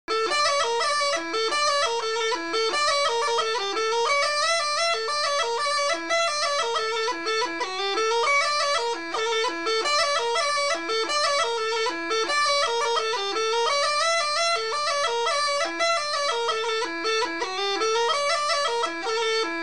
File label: Ruchenitsa Line, Gajda; Ruchenitsa Y Line#2; Gajda Lesson